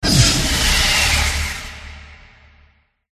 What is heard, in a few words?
angel